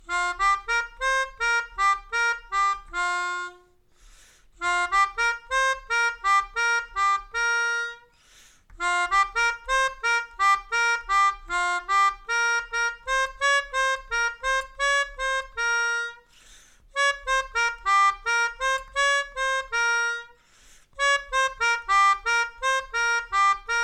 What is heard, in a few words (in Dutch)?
Mijn Melodie, Melodica